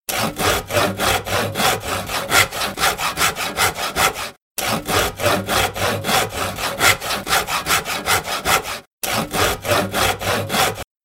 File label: sawing